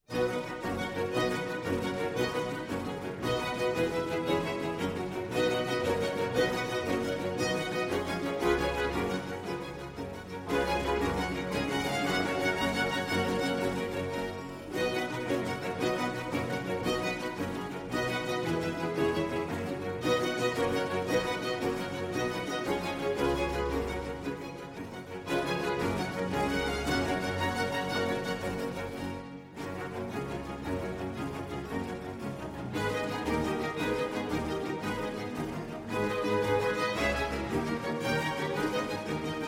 Concerto in C major for Strings, Allegro molto; Vivaldi

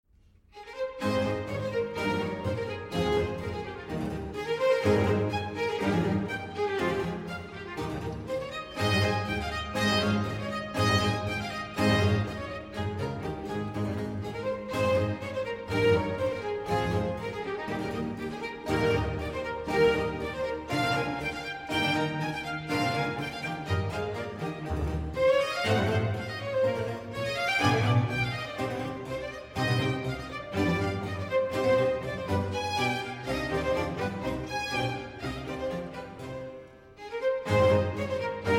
Concerto for Strings in A Major, Allegro; Vivaldi; Concerti per archi; Concerto Italiano

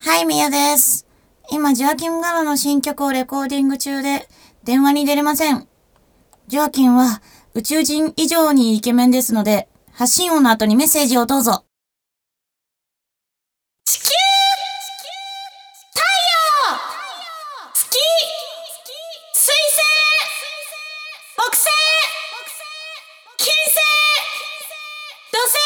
Accapella

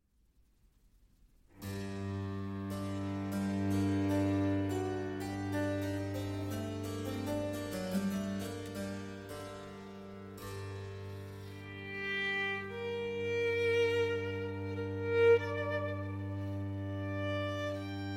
Violin Sonata in G Minor, Adagio; Vivaldi; Sonate di Dresda

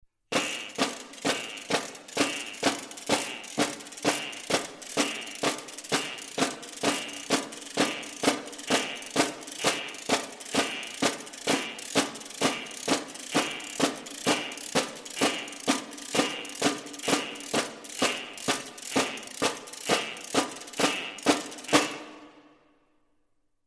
Toys (Percussion Insert); Santa Claus Le Film

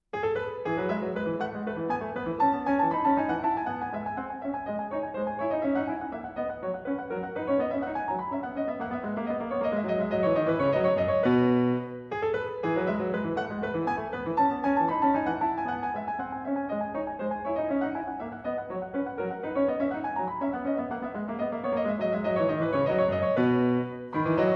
French Suite in E, Bourrée; French Suite No.6 in E, BWV 817; Vladimir Ashkenazy; Bach French Suites, BWV 812-817

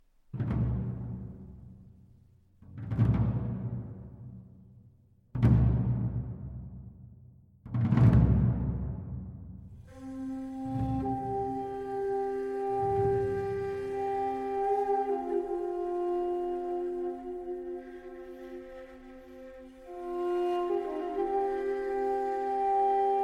Instrumental lament (Duduk & Kaval)

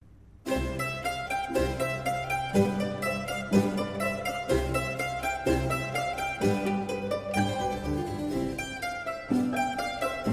Mandolin Concerto in C Major, Allegro; Vivaldi; RV 425; Zauber der Mandoline; Claudio Scimone

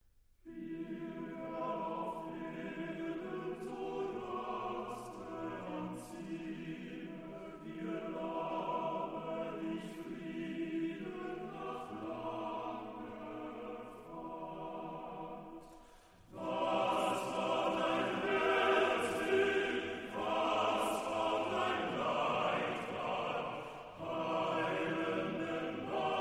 Song of the Grave (Arr. for Choir); Grablied, D. 218; Accentus

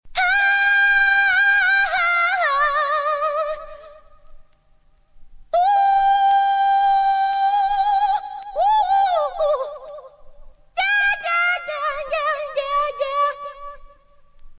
Scream

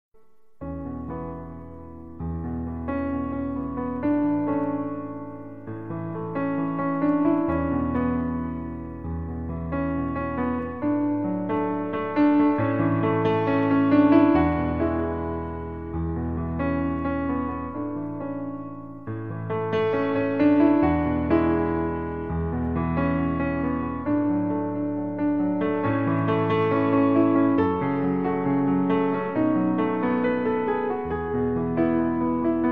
Piano Version

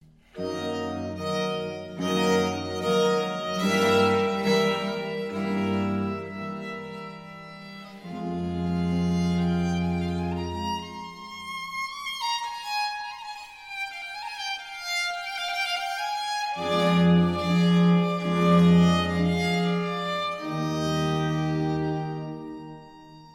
Trio Sonatas, No. 9 in A Major, Adagio; Vivaldi; L'Estravagante

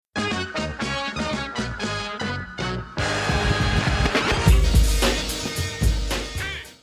Horns

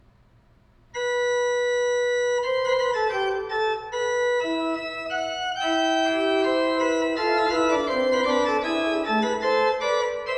Fugue, Organ; Magnificat quarti toni; Johann Pachelbel; Complete Organ Works

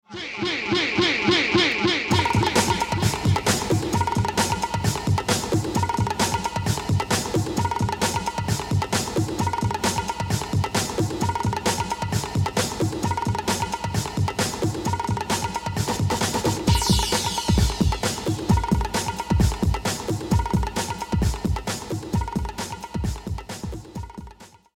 Beat

